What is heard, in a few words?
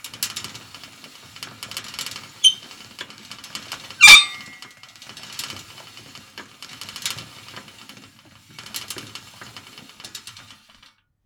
Submarine Crank